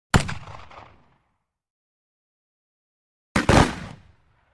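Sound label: Musket Single multiple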